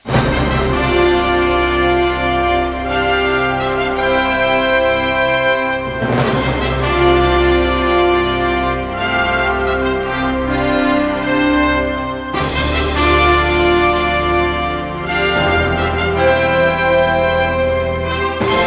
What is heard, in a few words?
theme; Star Trek Deep Space Nine